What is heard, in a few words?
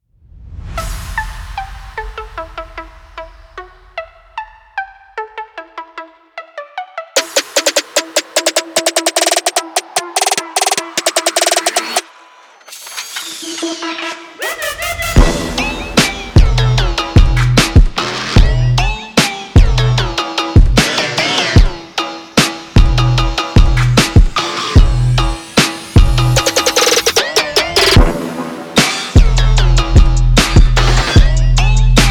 Trap